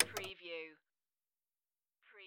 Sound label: Pinball sound, little flip or switch